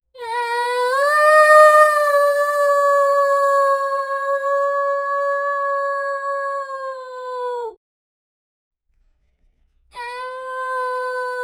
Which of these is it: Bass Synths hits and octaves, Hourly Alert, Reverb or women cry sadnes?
women cry sadnes